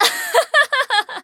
laugh

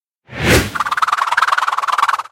Emote Bowler Spin Stone

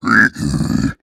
piglin angry; Minecraft

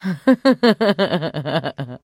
trader laugh; 七日杀; 商人杰恩

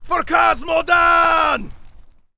warcry; Gryphon rider

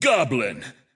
Goblin intense

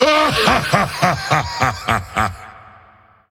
laugh; Volibear

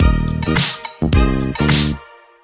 Wizard Disco Dance

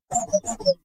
cheerful greeting; 守望先锋2; 堡垒; 你好

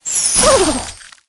ice deploy

